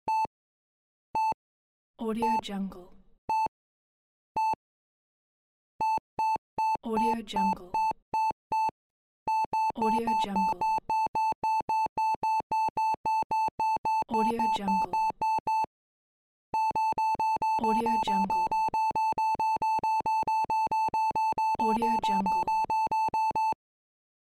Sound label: heart monitor beeps